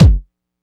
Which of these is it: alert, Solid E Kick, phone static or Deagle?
Solid E Kick